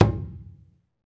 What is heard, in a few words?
Skin Drums